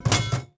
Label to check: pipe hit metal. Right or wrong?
Right